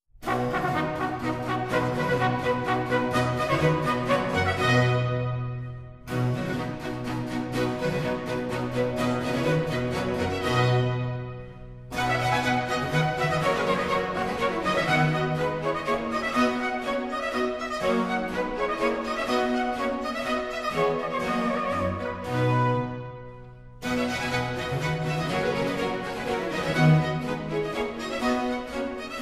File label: Trumpet Tune in C